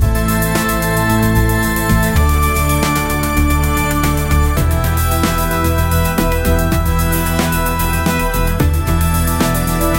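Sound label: energetic upbeat chase music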